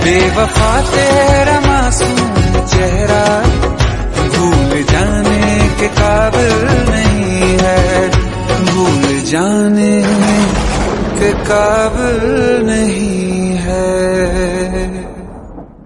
Sad Hindi Song Ringtone

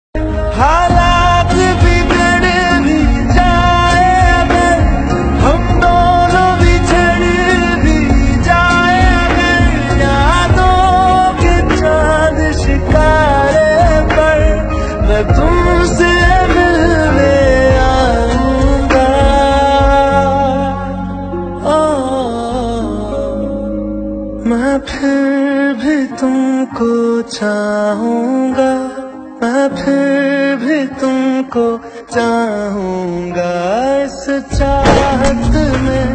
Heart Touching Love Ringtone

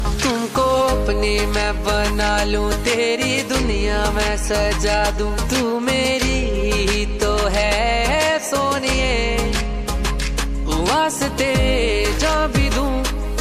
Love Song Ringtone

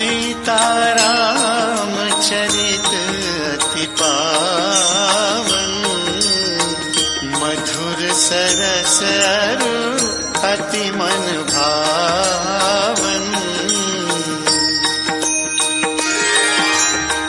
Bhajan Ringtone

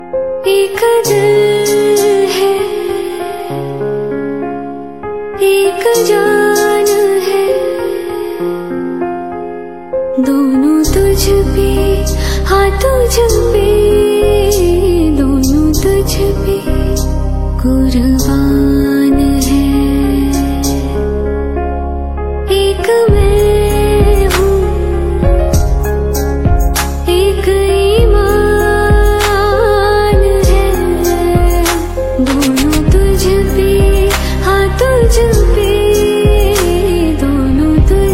Love Song Ringtone

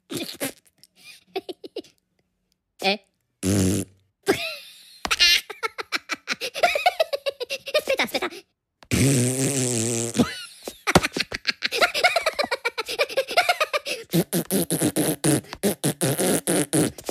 Funny Farts Ringtone; Minions

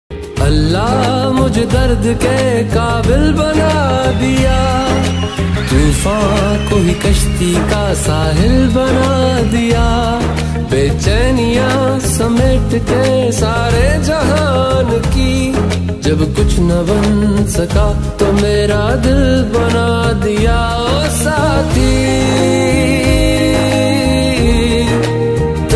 Romantic Hindi Ringtone; ringtones

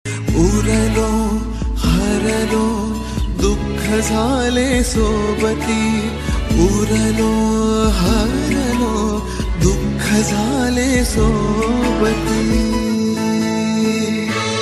Marathi Ringtone

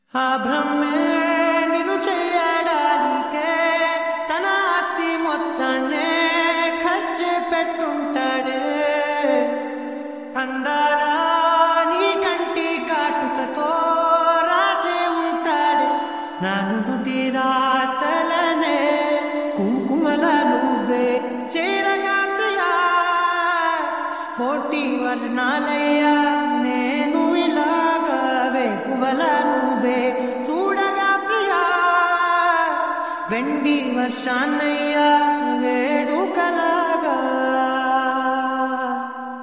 Telugu Ringtone

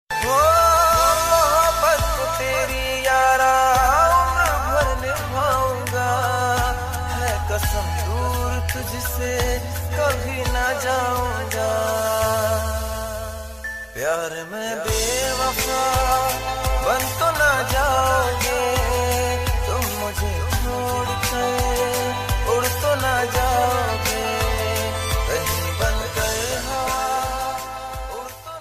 Sad Song Ringtone

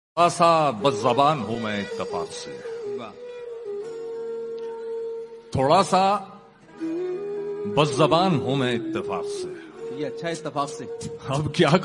Hindi Song Ringtone

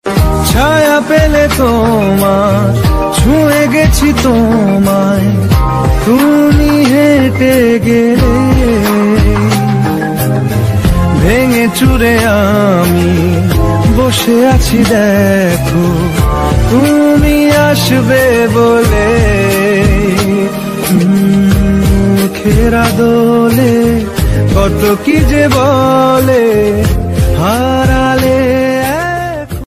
Bengali Ringtone

